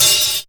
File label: Acidic Kit, Percussion; ohh; Dance Synths